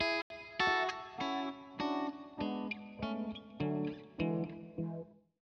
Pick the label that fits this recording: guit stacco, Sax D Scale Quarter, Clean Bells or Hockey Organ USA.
guit stacco